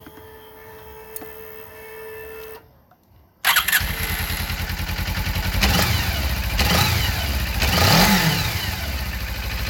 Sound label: Suzuki Moto